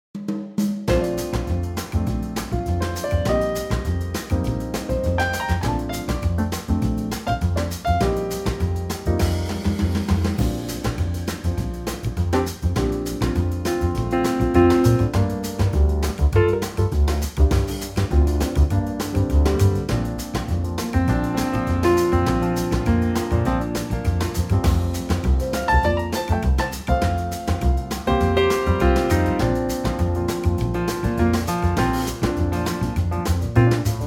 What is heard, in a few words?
Bb latin trio; key - Bb - vocal range - C to F; A superb Trio arrangement; works great for a male vocal too